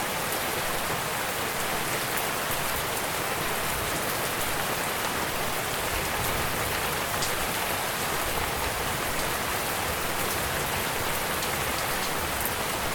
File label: rain; mobile